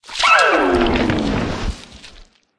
death; umertvie

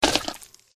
dragonkin attack contact bottom

